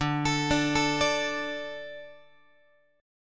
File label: D 5th D, down, Guitar; D5 arpeggiated; x,10,12,12,13,13; JGuitar